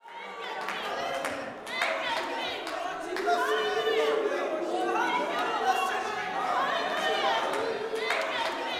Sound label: WORSHIP, Gospel